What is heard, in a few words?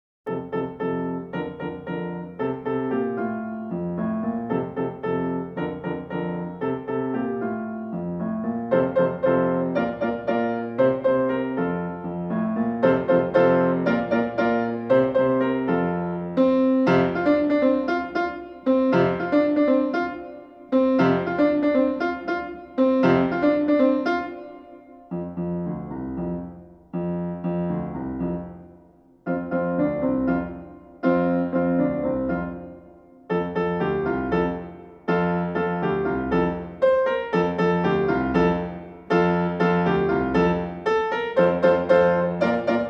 piano